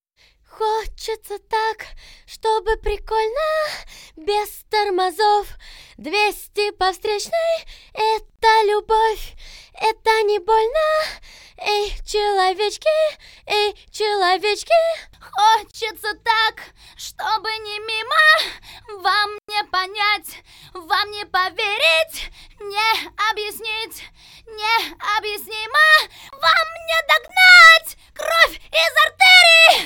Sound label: VOX Couplet, acapellas